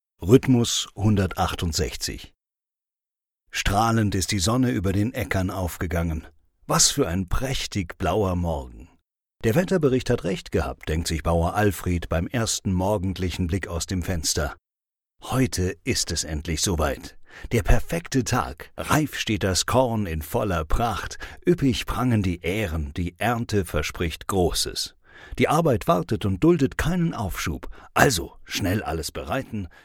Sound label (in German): rhythmus 168